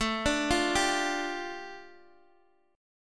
A 7th Suspended 4th Sharp 5th, A, down, Guitar, Open G